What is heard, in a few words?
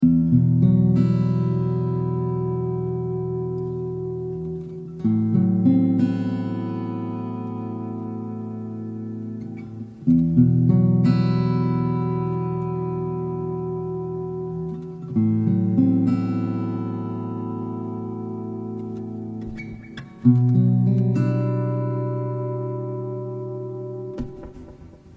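Em 95 guitar motif volume pedal fx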